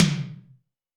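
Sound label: Tom Mid Stereo, Alesis D4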